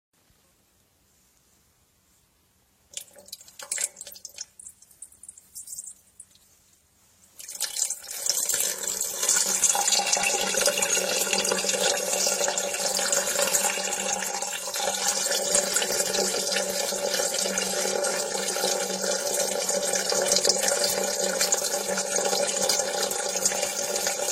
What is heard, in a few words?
Peeing Sound Effect